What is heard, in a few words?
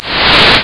scratch, cartoon